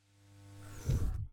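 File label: Teleport Transport Reverse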